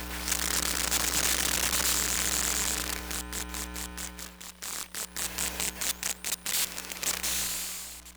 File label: Computer Tower, Electromagnetic Sounds; Micro Pack; NazdyNate